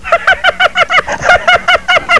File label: LAUGH